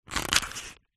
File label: household match box open; zapsplat